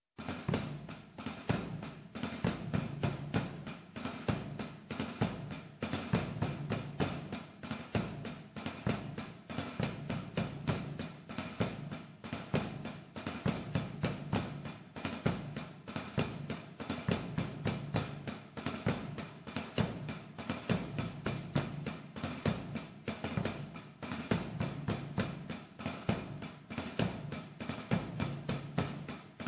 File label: tambores; Andorra; A Rondar